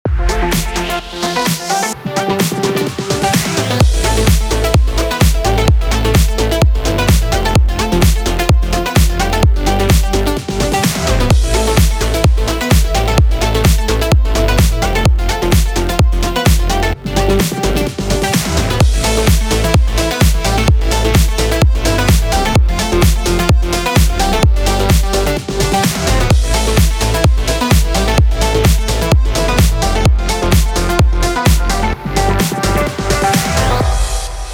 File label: BED ARP HOUSE AMIN 128BPM